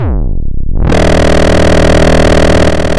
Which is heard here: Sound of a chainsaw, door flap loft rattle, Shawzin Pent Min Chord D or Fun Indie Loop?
Sound of a chainsaw